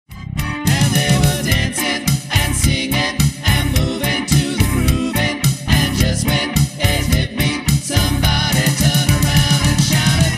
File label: funky music